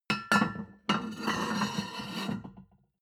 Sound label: plate on plate place slide soft, restaurant cafe; sport-freizeit